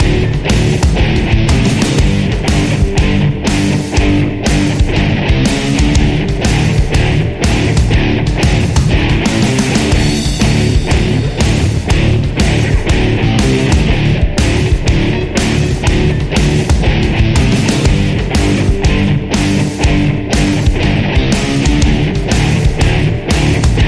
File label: Howling Blues Railroad